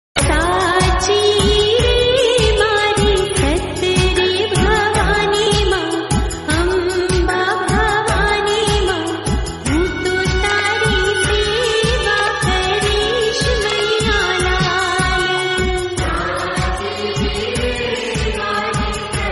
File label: devotional gujarati